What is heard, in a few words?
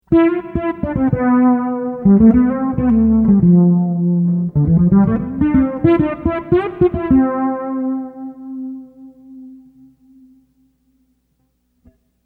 reverb chorus